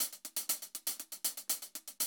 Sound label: 120, Classic Drum Loops